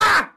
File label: AHH